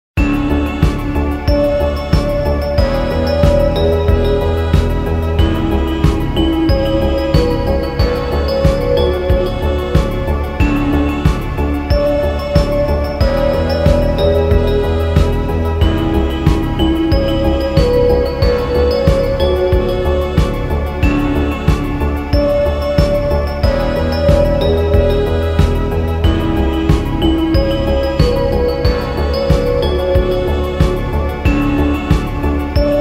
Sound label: Vaporwave; Retrowave